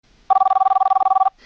telefoon ringtoon

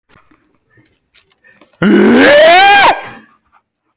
de grote krijs

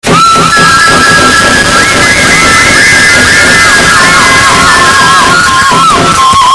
fluiten; hema

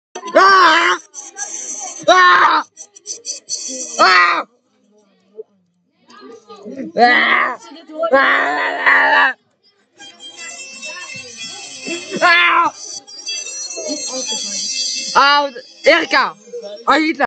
harde schreeuw

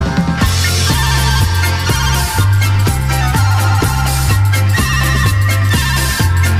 polkatje

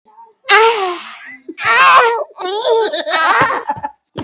kreun geluiden

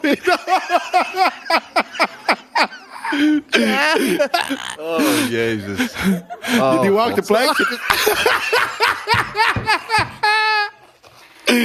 lach

